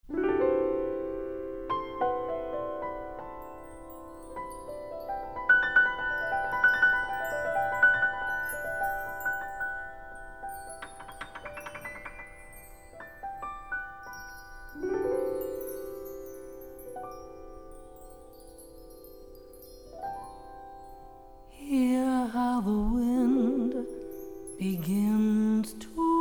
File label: Jazz